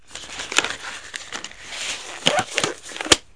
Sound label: Surgical Glove On; KABOOM!; City Life; Business